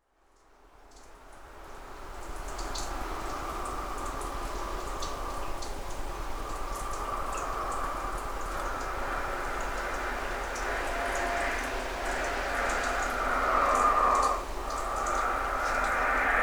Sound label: breathin arp